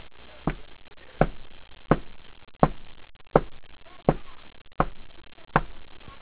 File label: STEPS